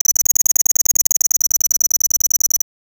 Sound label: a futuristic glitching, synthesized